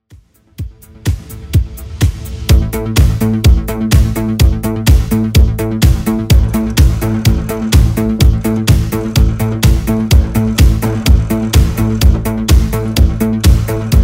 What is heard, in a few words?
80s 126bpm; Redrum V2